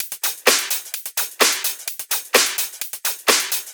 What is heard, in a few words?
Houseloops 128BPM; Vengeance Samples